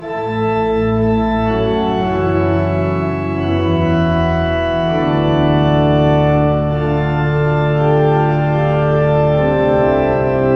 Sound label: Organ interlude; Colloquium; Vespers